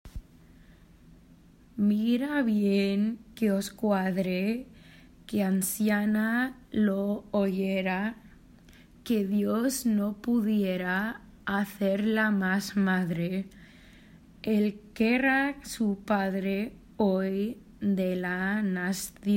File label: RIU RIU CHIU SOLO Verse